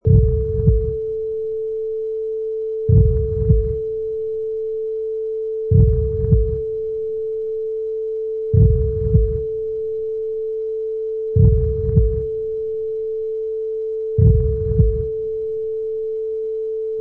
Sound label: Heart Deep n Slow